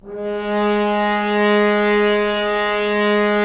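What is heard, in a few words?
Solo French Horn, brass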